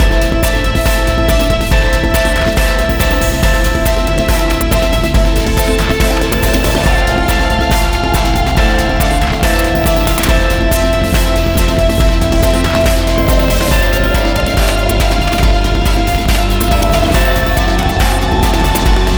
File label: Trainride Stationary Fast; rive wreck hack die retry; ps4